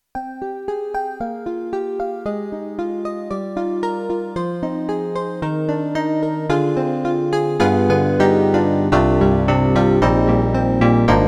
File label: YAMAHA MU1000EX, DX Koto EP